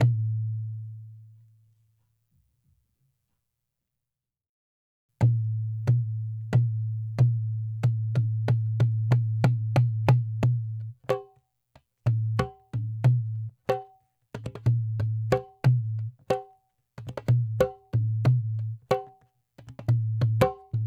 dholak 092, Hip Hop India; Hip Hop India Modern Percussion

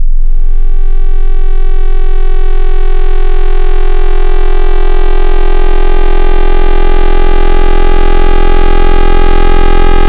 Sine to FM, FM Wavetables; Cymatics; FM Wavetables Vol 2; Serum

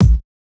Kick Hit, Bmin; Hip Hop; Hip Hop Exotica; Apple Loops; Big Fish Audio

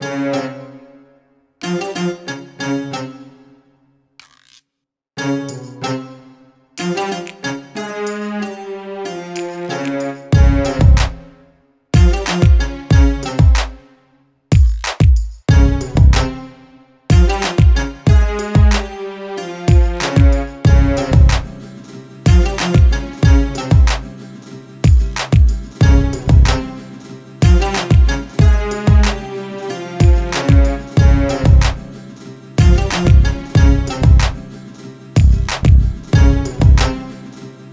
093 Amin, Hip Hop Exotica; Big Fish Audio

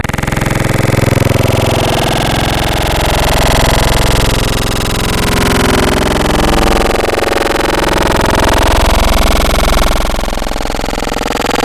Halves, Growl Tables; Cymatics; Growl Tables Vol 2; Serum

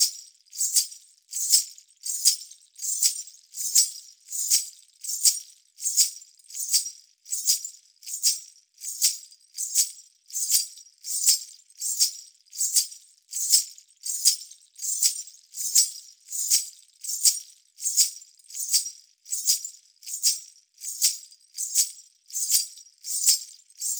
ghungroo 080, Hip Hop India 080bpm; Hip Hop India Modern Percussion

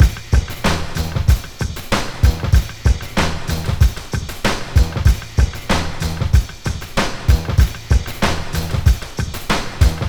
Beat 095, Hip Hop

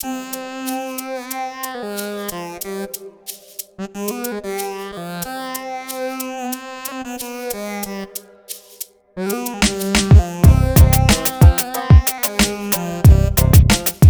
092 Cmin, Hip Hop Exotica; Apple Loops; Big Fish Audio

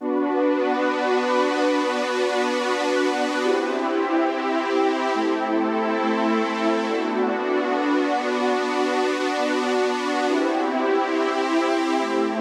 140 BPM B Min Pad; Cymatics; Destiny Gold Expansion Stems; Analog Nightmare

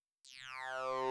Synth, 084 Gmin; Hip Hop

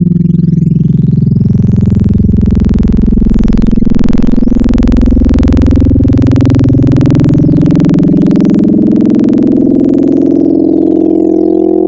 AM Sine, wavetables; Cymatics; Wavetables Collection Vol 3; Serum

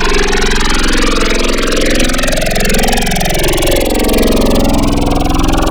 Growl Table, Serum; Cymatics

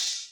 Hi Hat; 098 F#min

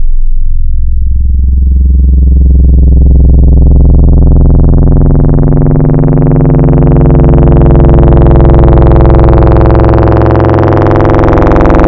Dirty Sine, Wavetables; Cymatics; Future House Wavetables; Serum

